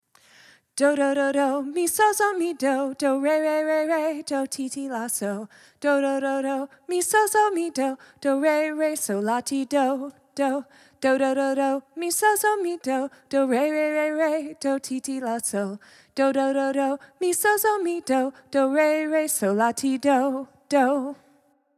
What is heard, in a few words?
mulberry bush solfege